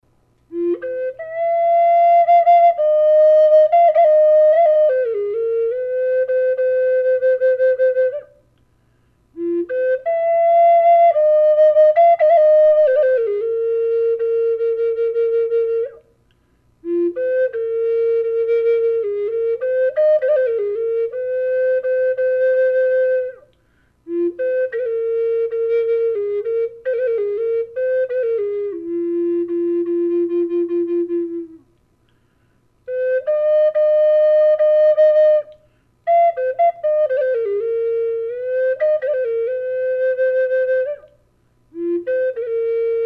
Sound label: Kodiak Bear Flute; collector flutes